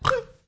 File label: piglette collision